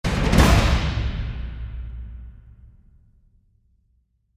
Revenge Multiple Slam; Gothic Storm Music

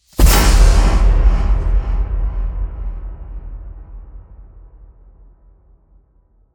Impacts Demolition

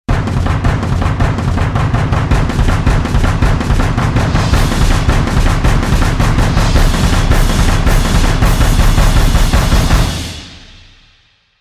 Drums Only Version

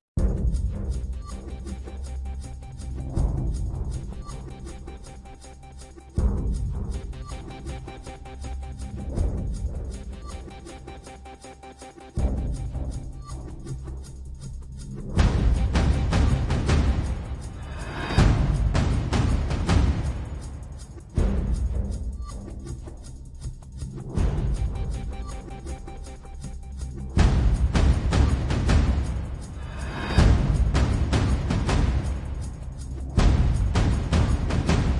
Perc and Pulses; Brand X Music

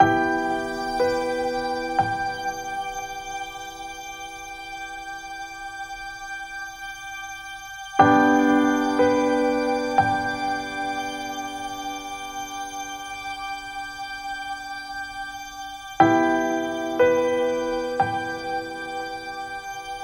Reflection Ambient

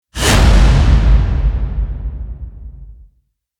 Whipper Hit Swell; Ninja Tracks

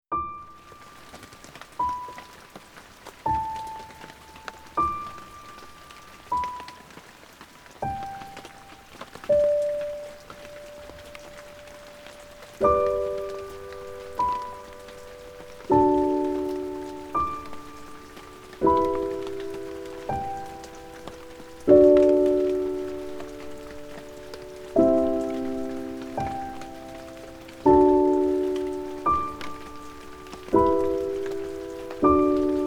Piano & Rain

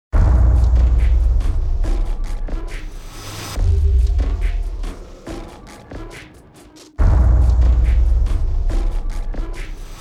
Turn and Run (Percussion); Fired Earth Music

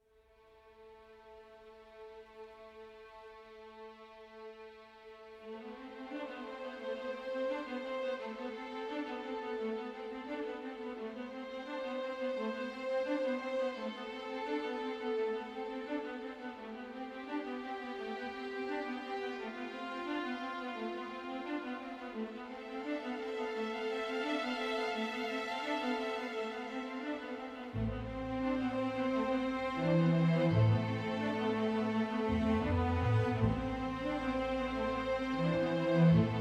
Calliope (Orchestral); Fired Earth Music

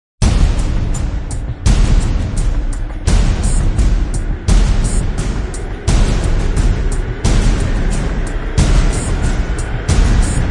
Rising Pulse; Epic Tools vol. 1

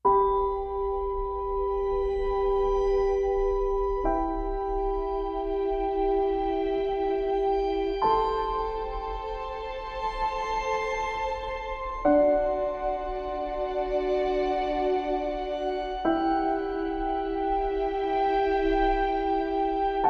Calm Ambient

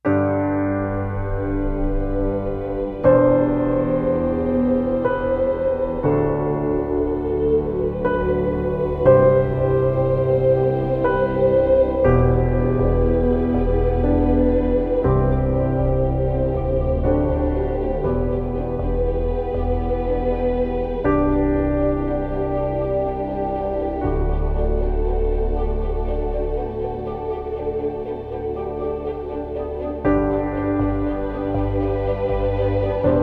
Togetherness Ambient

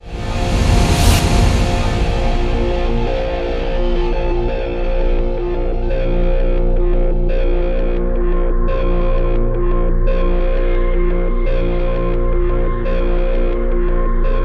Hit Title Touchdown (Bb); Brand X Music; Brand X Music Catalogue - Editor's Essentials

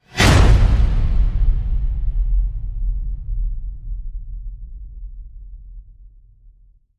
Imminent Whoosh; Gothic Storm Music

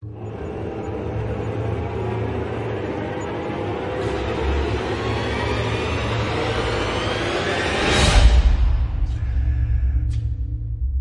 Riser; Gothic Storm Music

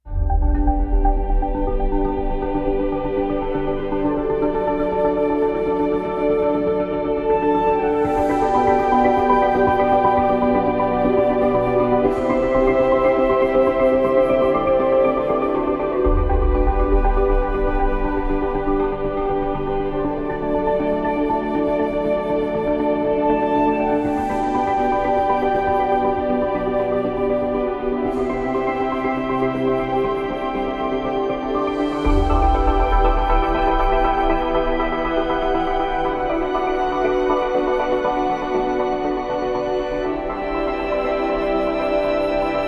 Ambient

